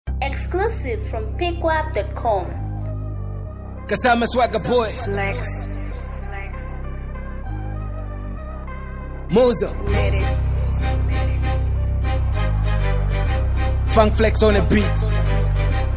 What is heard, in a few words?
Hip-hop Freestyle